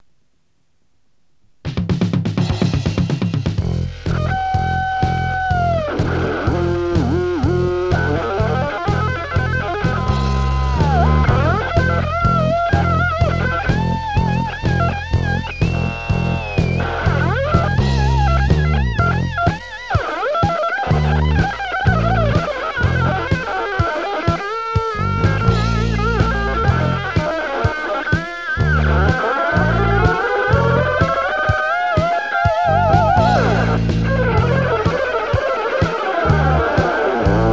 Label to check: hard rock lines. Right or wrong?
Right